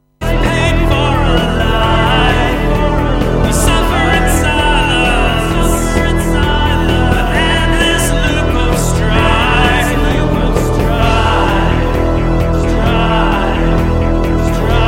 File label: tape loop